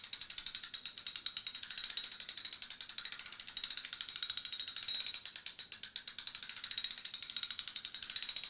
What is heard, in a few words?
socket wrench; vcmod